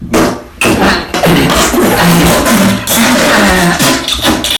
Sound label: shitting hard; Farts